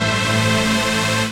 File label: chord A; Techno Trance Essentials; CHORDS